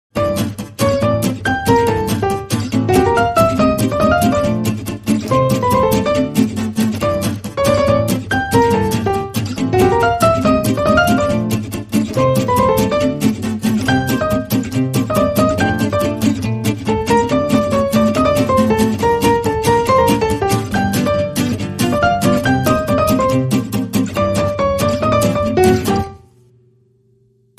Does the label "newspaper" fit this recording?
No